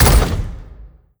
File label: step backup foot, dog; npc; scripted